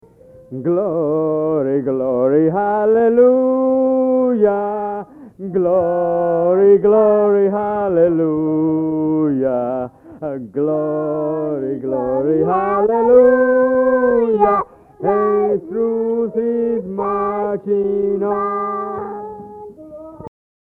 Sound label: Grandpa singing Battle Hymn of the Republic